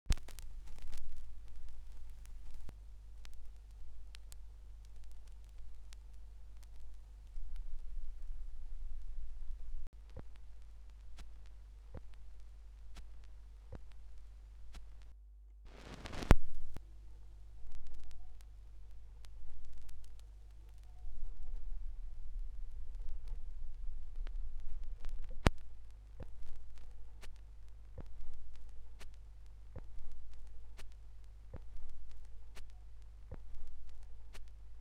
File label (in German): schallplattengeraeusche